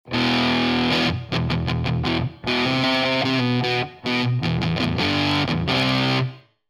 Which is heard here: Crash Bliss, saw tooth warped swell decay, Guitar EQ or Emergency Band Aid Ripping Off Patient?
Guitar EQ